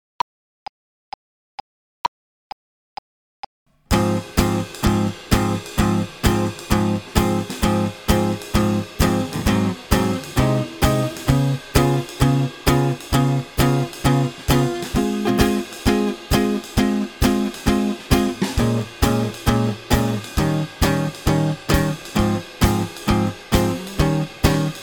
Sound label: blues swing slow